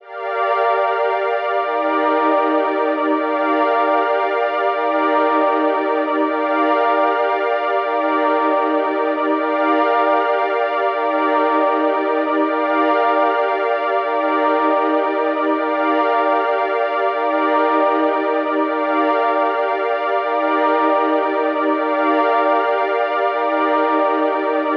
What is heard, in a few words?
155 BPM G Min Chords; Cymatics; Destiny Gold Expansion; Neon